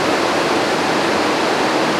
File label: Inharm, Noises; Xfer Records Serum; Serum Presets; FP Inharms